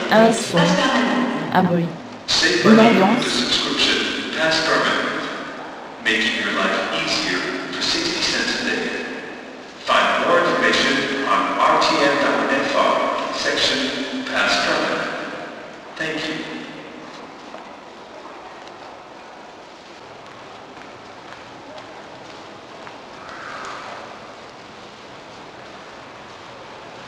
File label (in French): Un son un bruit une ambiance